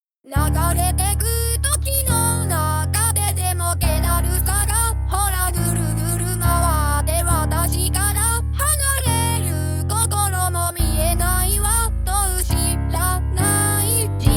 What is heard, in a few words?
vocaloid pirate